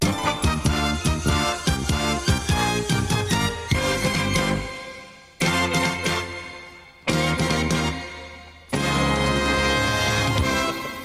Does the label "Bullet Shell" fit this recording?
No